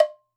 COW, Percussion; Sampleheads; NYC Percussionworks; GJ PERC VOL1

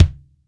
MIX12 KICK, FUSION KICK

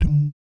BS DUM, Vocal Planet Jazz & FX; ILIO; BASS DUMMS

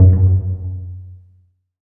PIZZ, Dance Mega Synth; Best Service; 90 s Sample CDs

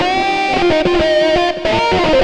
xtra lead, Leads; Sonic Foundry (Sony Creative Software); Ugly Remnants VOL-2

